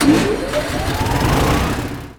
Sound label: Machine Generator Start; Machinery - Generators